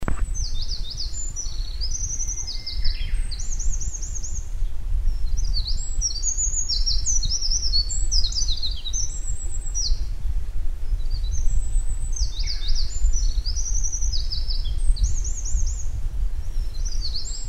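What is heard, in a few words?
misosazai, wren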